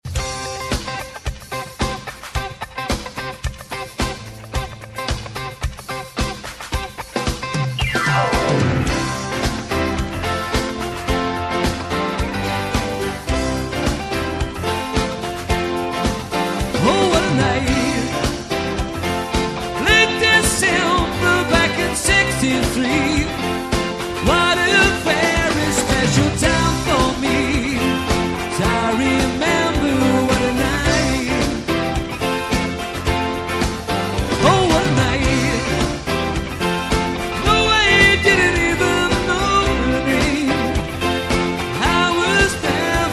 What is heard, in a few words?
Solo Live